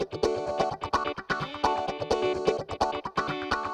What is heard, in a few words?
Electric Guitar 128BPM D maj; Vengeance Samples; VEH3 Electric Guitar Kit 2